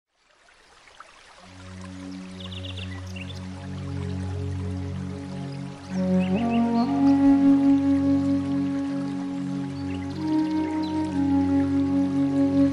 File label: Soothing Background Flute